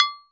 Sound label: BANANA BELL; AKAI S6000 CD-ROM - Ultimate